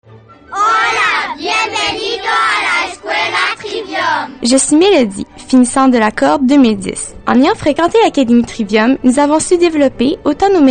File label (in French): melodie